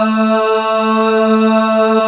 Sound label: Male Ooz Az; 90s Sample CDs; Club-50 - Foundations Roland